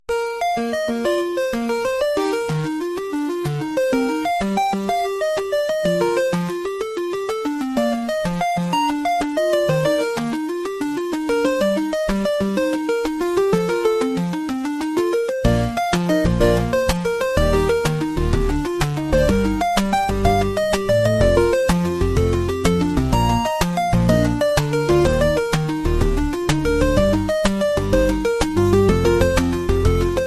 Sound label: bgm